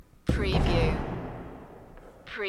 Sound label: BBall Backboard